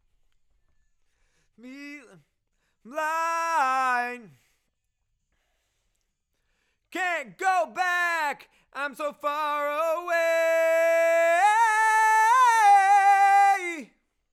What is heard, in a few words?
LV Choru